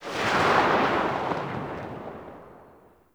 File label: Tail, Weapons; Warframe; Braton